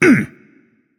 nv tongue; cupid

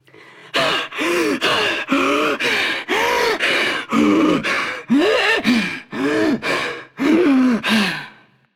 breath; cupid

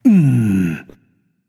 nv tongue; smite; voicepack; hachiman

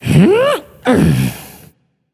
grunt lift, voicepack; smite; jorm

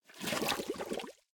flame trail; Smite; Sol

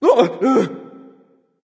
fear; smite; hou yi

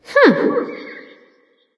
thinking light; smite; voicepack; hel

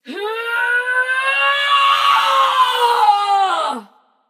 battlecry passion; chaac

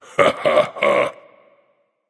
heh heh, voice; Smite; ymir skin14

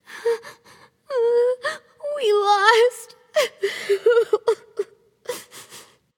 boo hoo; smite; voicepack; jingwei

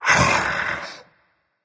sigh, berserker; cuchulainn

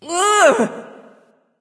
"ugh"; smite; voicepack; nike

